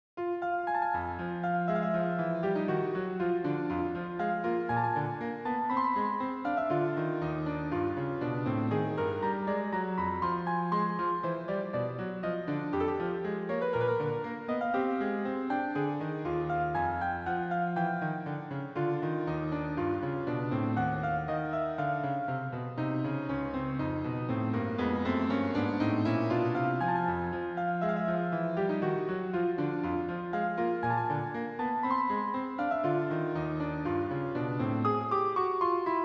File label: bourree